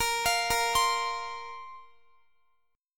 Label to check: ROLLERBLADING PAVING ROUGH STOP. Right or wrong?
Wrong